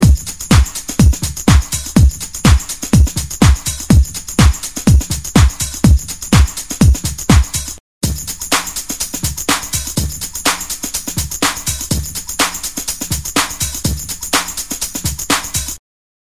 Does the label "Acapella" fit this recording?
No